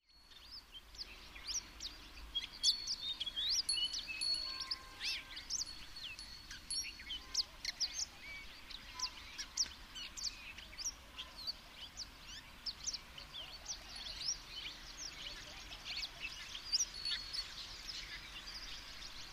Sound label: White bellied Minivet Pericrocotus erythropygius, white bellied minivet call